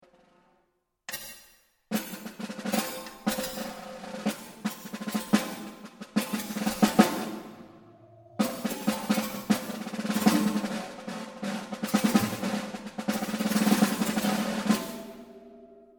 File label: snare drum computer